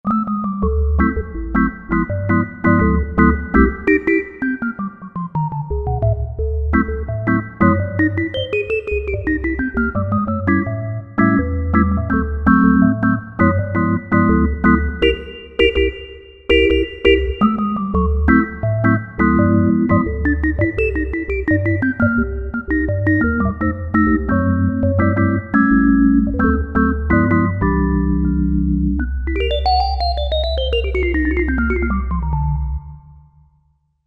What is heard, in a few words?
16' + 2 2/3', Korg MSC-9S Organ